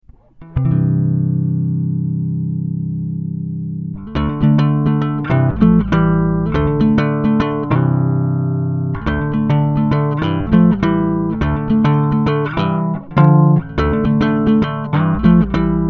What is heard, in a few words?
bass sound sample blend; shishido